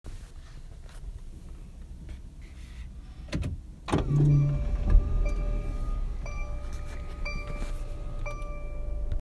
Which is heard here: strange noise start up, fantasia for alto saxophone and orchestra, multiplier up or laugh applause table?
strange noise start up